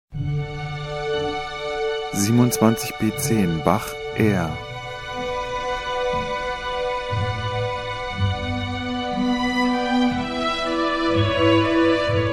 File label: Bach Air; Klassik; Johann Sebastian Bach